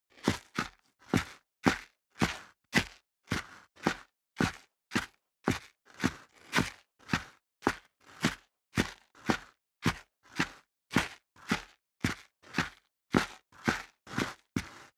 step boots concrete grit upstairs sequence; schritte

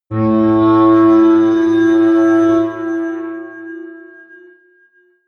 atmospheric feedback synthesizer low, drones

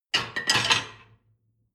dishwasher plate place

